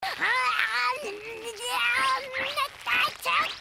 noises; gabriel dropout; satania

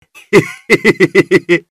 laughing; scav; tarkov

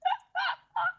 hahaha

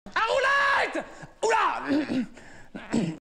a roulette

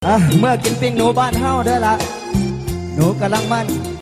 haha

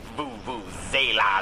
vuvuzela

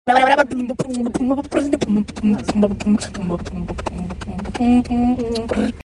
epic beatbox

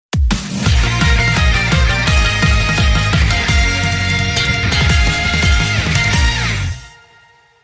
stage clear; mega man x legacy collection soundtrack; MMX Legacy Collection

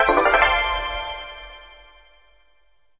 level up

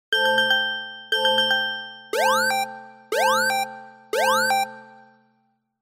google pixel emergency sos sound

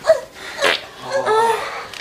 fart

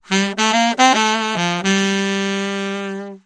lick tenor sax

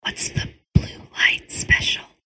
glitter asmr

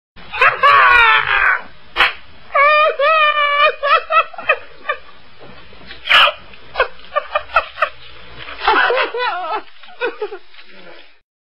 crazy laughter

